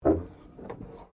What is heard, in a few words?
WRECK HYDRAULICS EXTEND, Wrecked Cars; impacts, crashes, scrapes; Objects